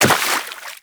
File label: Operator Walk Water, Footsteps; Warframe